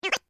Toy Squeaky Piston Short, Strange Toys; Miscellaneous Toy Sound Effects; Miscellaneous Toy (Sound Effects:Toys)

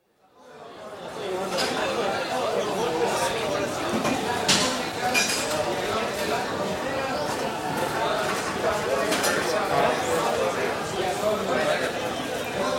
Tijuana: Bar atmosphere; Bars And Club; BBC

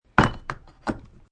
Hammer dropping onto wood.; Bangs; Objects

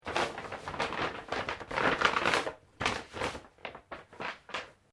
PAPER BAG LARGE MOVEMENT; Material components for constructing more complex sounds; Materials

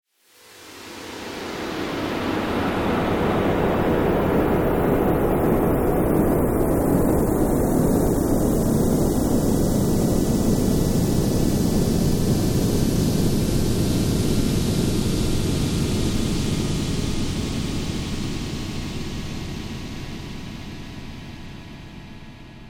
Reverb Swell Phase; Horror, Musical, Reverse, Piano, Eerie; Scary Soundscape Sound Effects; BlastWave

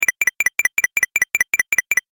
Retro Game Repeating Sound

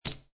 Poker Chips Drop One Chip Onto Another Chip; Gambling

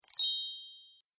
Sparkle Interface Sound, beep sound effects

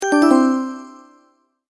multimedia tone alert

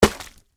Impact Watermelon, Texture, Drop, Fruit; Splat; Drops

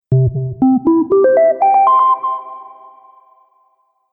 Soft Subtle Notification; Musical

